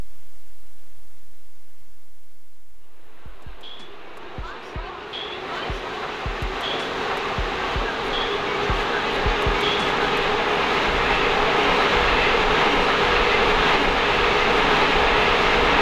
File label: Ambient Loops